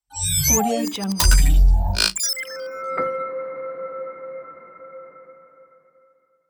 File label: glitch logo